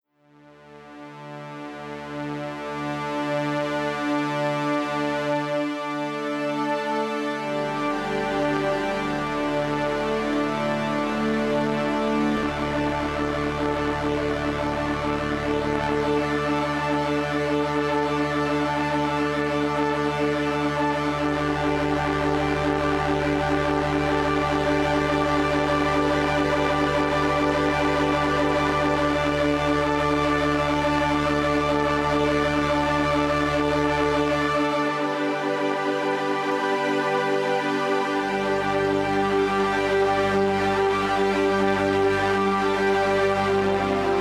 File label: streichfett